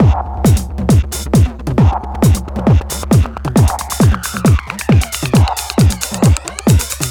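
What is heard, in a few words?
drum loop 135, left; House; Best Service ProSamples vol.10 - House; AKAI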